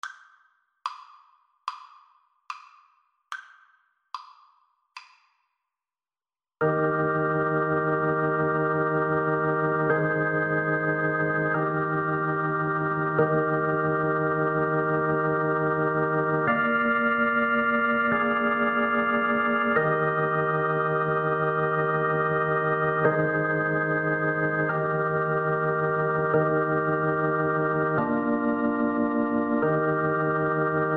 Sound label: swing low, Saxophone